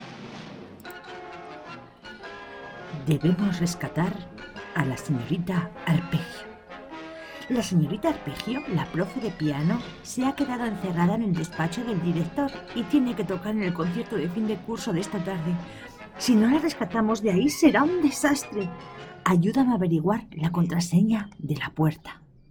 Arpeggio

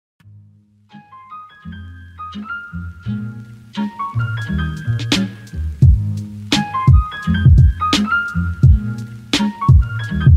Outdoors Aesthetic

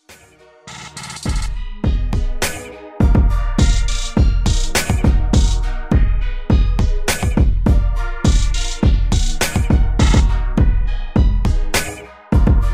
Instrumental

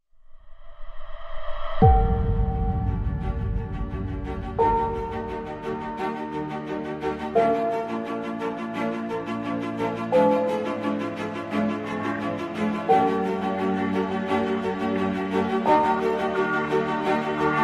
backsound cinematic

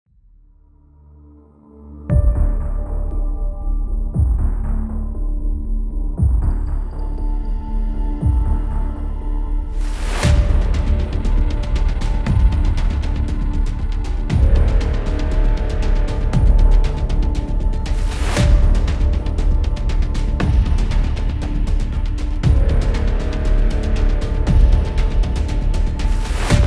Suspense Background Music Suspenseful; copyright free music

